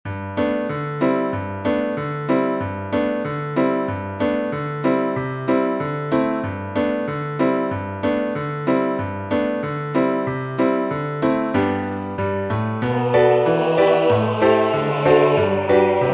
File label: Bass